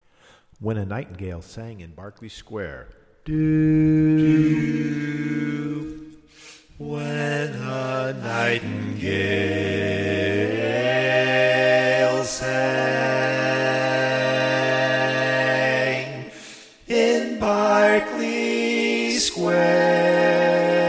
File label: Bass